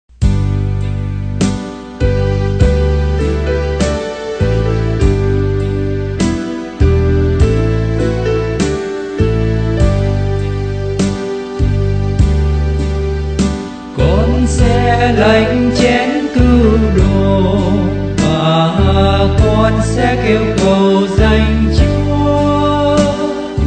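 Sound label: soprano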